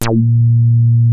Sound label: WEST HARD B2, ANALOG BASS; Music Store - Claudius Diemeter Schwerlos